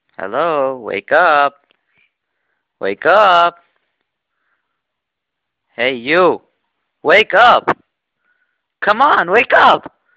Crazy Wakeup Alarm

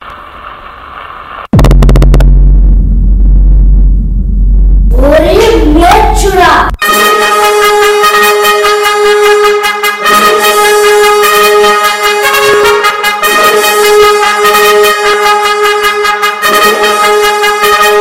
Mahanati Ringtone

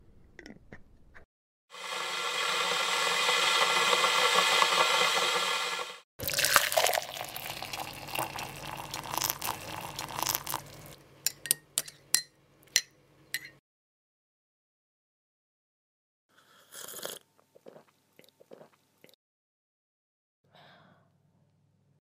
Tea Making Asmr

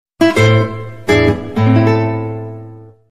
Guitar

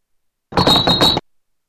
Grenade Clink; COD 4

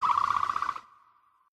growl

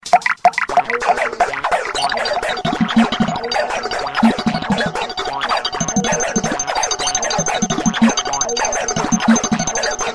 Electro

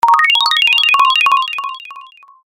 Melodic Sms

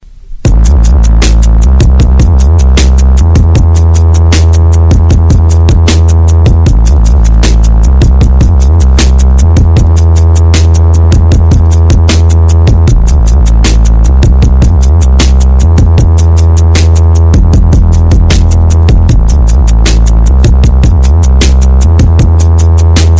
Boomb Bass

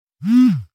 Quick Vibrate

